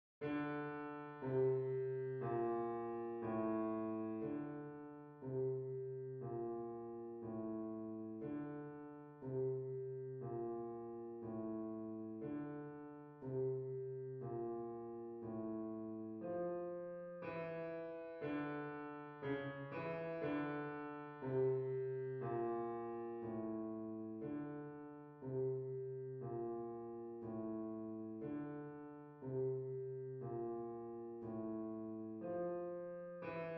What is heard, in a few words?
swing